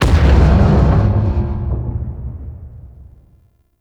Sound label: Ship Damage Explosion; RailJack Hit Effects